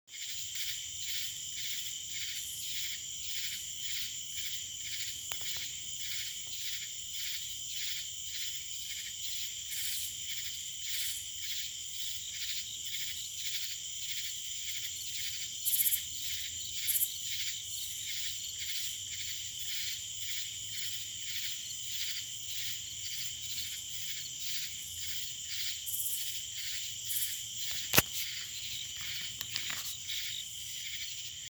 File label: night music; Flying Squirrel Outdoors